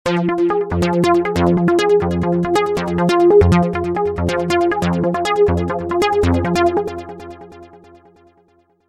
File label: Arp7